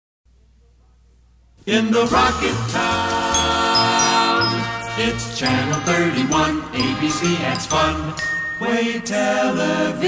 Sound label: jingle; rocket town